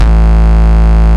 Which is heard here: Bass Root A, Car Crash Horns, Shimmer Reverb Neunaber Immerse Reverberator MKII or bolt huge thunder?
Bass Root A